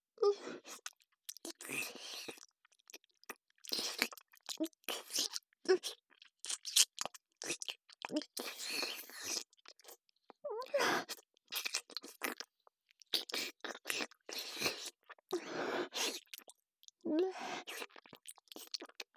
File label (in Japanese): 唾液を交換し合う絡み合うディープキス, ASMR